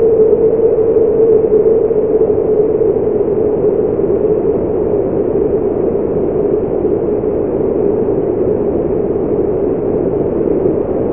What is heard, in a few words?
Wind